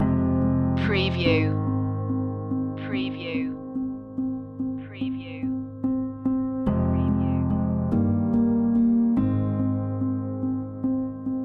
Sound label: trapped in reality loop